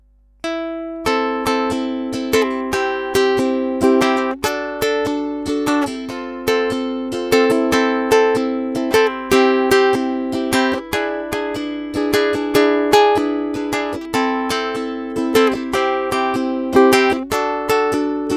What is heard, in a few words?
vox house amp, ukulele